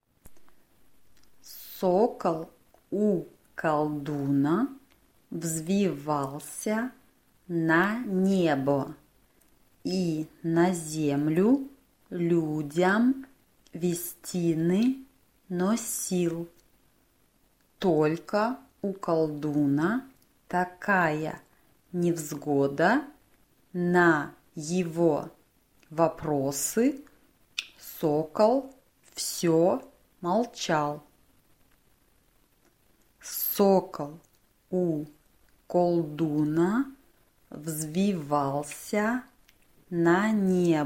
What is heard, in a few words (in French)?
valse couplet parle